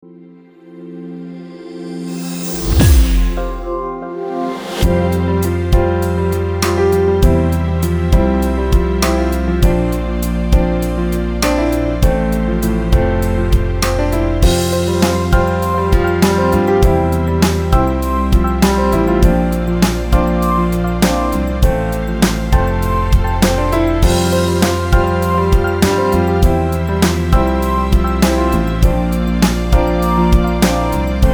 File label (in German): Warteschleifenmusik mit Soundlogo; Logata